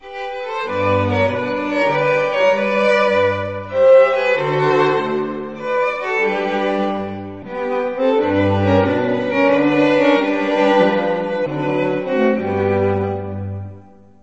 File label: Minuet; Classical